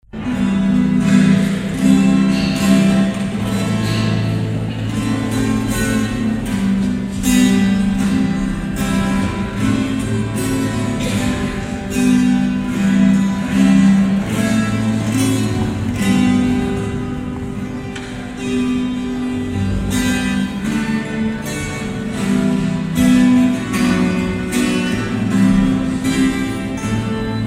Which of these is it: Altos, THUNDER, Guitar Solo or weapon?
Guitar Solo